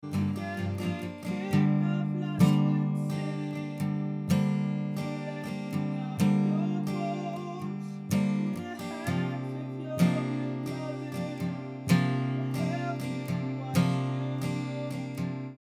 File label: Figure 8 Guitar Separation; Recording Mistakes